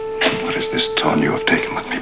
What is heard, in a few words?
tone